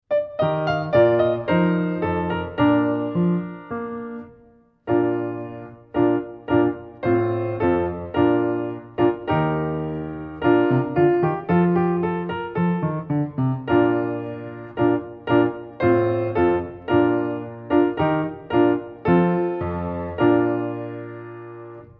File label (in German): te deum begleitung; froehliche klarinette